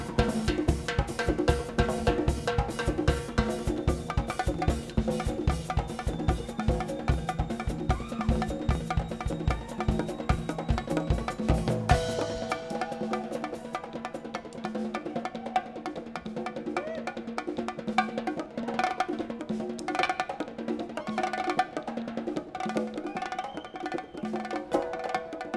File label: Drums